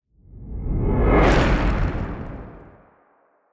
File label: whoosh Smoke